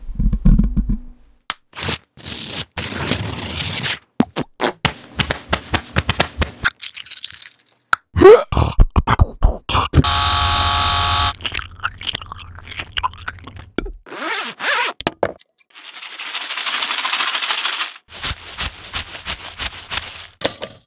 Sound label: Real Recordings